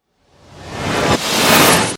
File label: Dimension, Portal, Suction, Science Fiction, 5.1